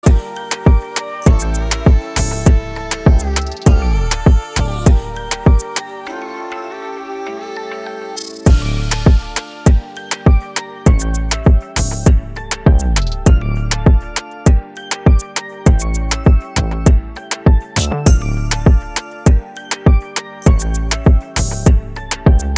high beats

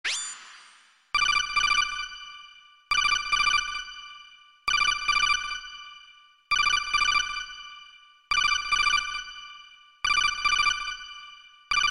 Codec Ringtone

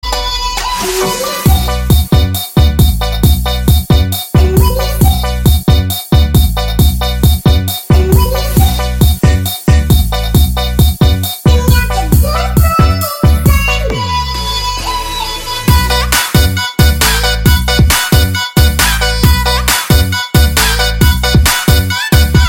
jungle dutch sound

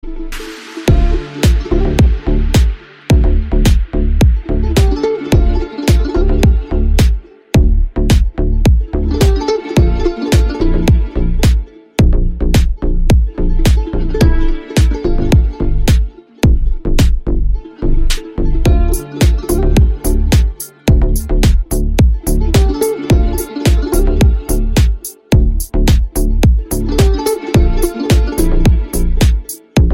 House music Ringtone

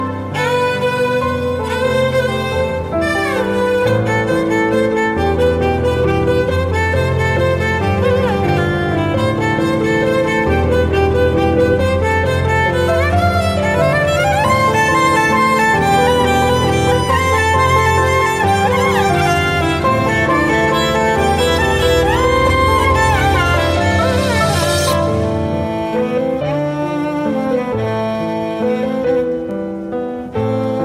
violin; 3 movie bgm

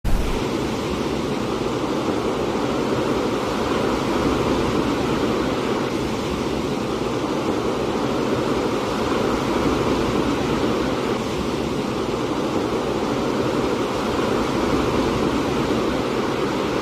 Ocean

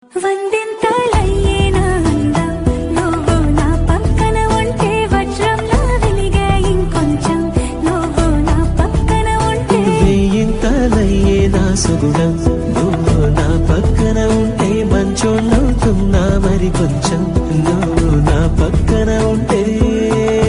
lyraanaa bgm; game changer

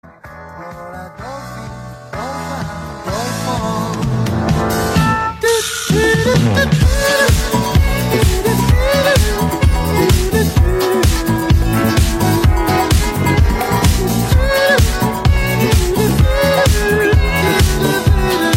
Techno Song Ringtone

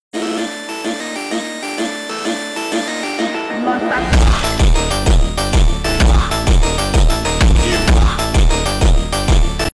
funk ringtone; winter arc

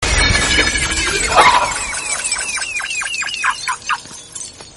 Bumblebee siren; Transformers

